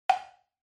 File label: Countdown